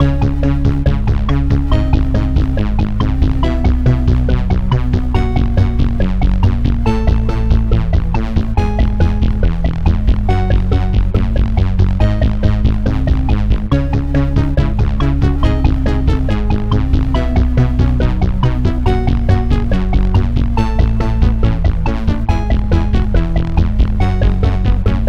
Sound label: Judge Music; Earwax; Jackbox Games; The Jackbox Party Pack 2